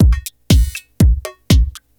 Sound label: Tech Beat 120, techno 120bpm; musicradar; Beats